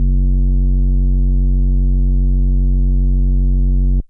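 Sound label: FM Sq Dist DX100 F#0; square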